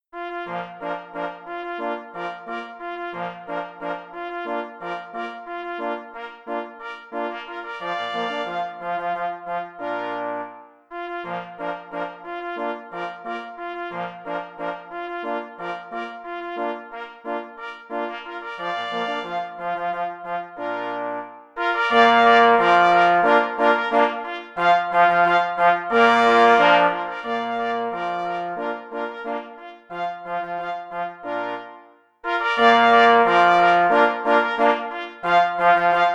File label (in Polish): Marsz na 2 plesy i 2 parforsy; J. Rosner